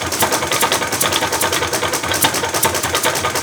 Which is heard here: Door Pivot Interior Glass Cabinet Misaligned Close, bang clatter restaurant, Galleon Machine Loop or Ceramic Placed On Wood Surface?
Galleon Machine Loop